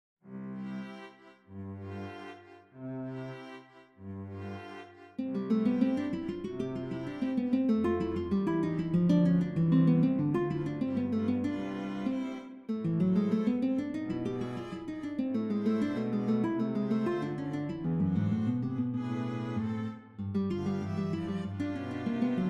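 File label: Bagatelles with string quartet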